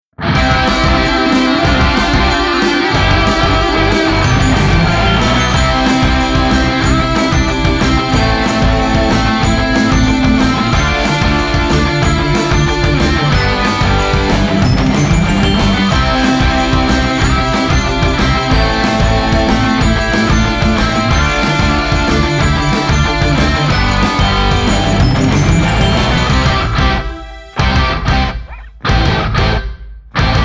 Christmas Rock; Merry Christmas Rock

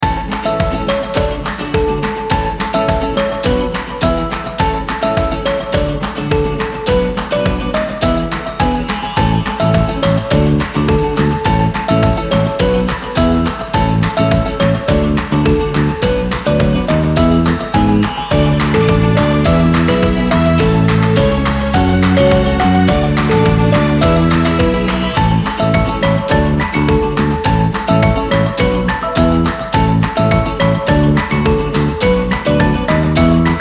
Happy and Upbeat

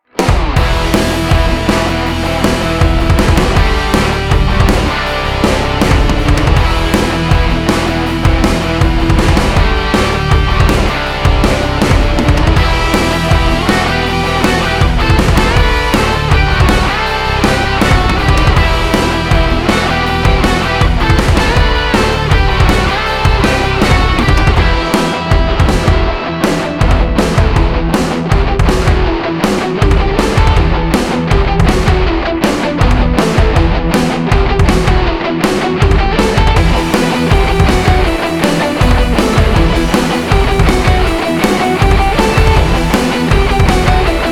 Old School Rock

